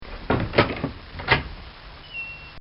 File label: Hinged Stall Door Opening